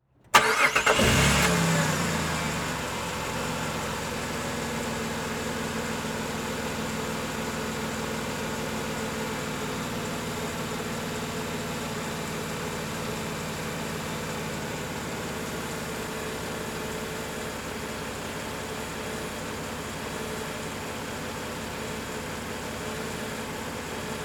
Transportation Jeep Commander Start Idle Off Exterior